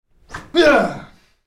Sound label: karate sword swish